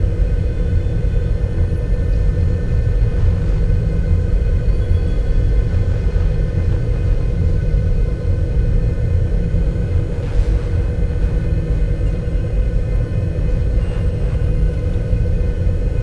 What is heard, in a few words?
star eater open energy; Stellaris; nemesis